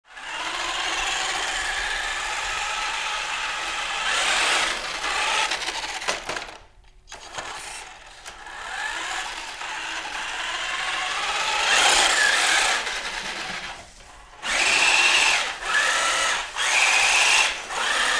Rc Car Radio Controll

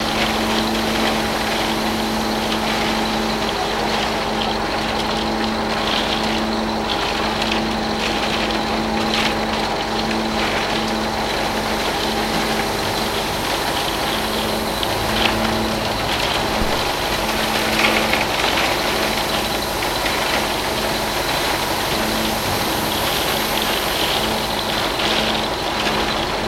Cement Truck Action

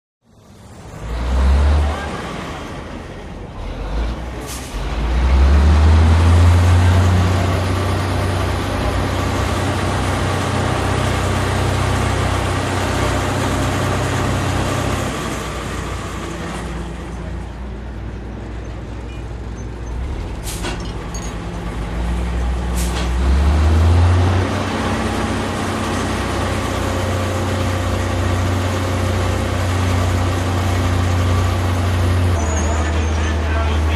Hydroplane Dock cra

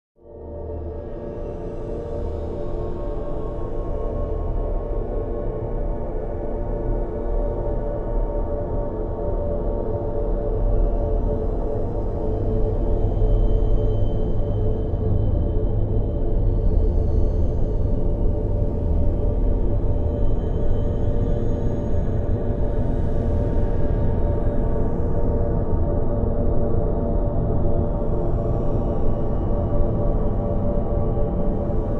Bed Choral Drone Meta

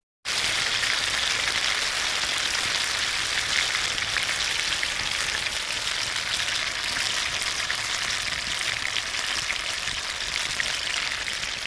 Meat Sizzle, cooking

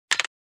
Futzed Phone Receive, hang up

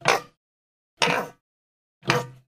Lizard Tokay Gecko

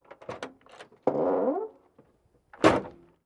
Car Door Oc Large Door

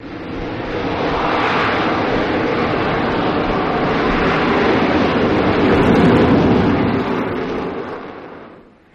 C Harrier Hover Move, AV-8B Harrier

